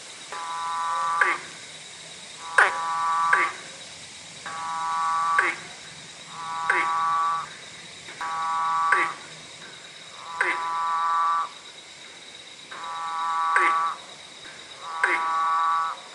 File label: cyclorana brevipes; Superb-collared Frog